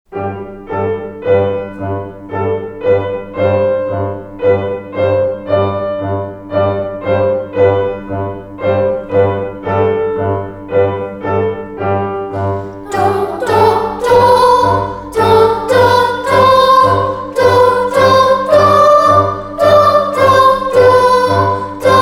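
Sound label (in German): Übung; musikschule bayreuth; kinderoper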